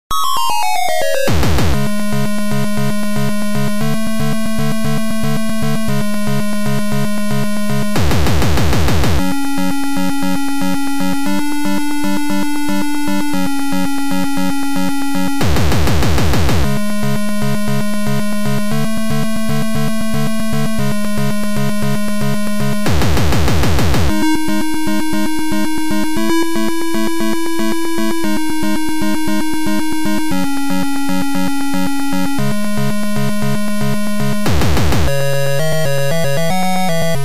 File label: Title Music; Oriental Hero